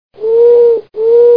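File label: owl strigidae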